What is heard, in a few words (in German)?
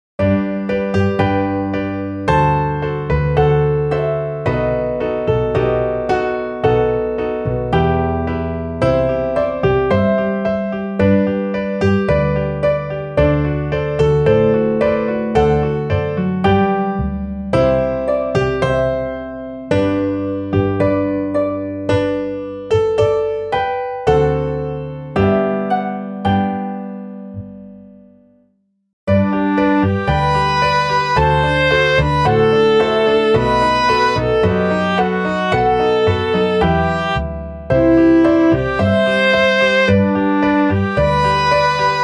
midi marschlied klavier